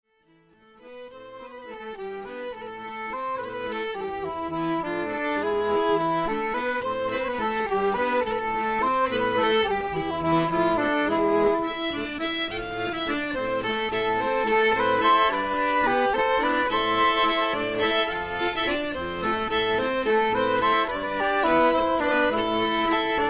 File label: Valse a 5 trad, balfolk